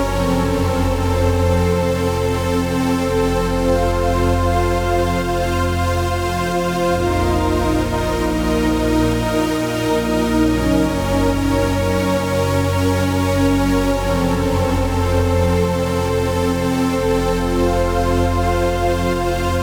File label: 138BPM Pad Full, F major; Melody & Synth; Vengeance Samples; Another Day Kit